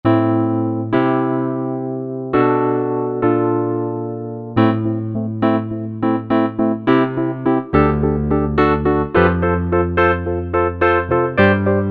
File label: Whirly, Korg 05RW